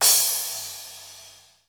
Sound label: MPC4 CRASH; Crypton - DrumBase5000; ELEC.DRUMS